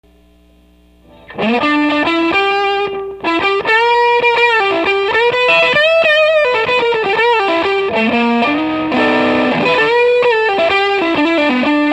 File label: van rear